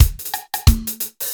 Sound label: menu